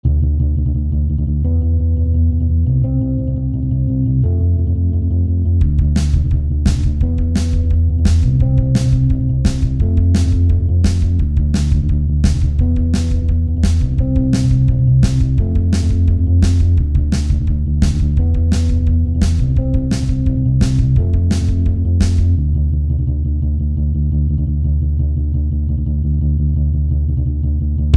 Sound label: simple bass riff